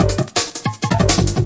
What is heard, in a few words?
165 amen var; 161 to 180 bpm